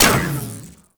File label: Minigun Fire Start; Warframe; Corpus; Corpus Railjack; Spec Ops Minigun Agent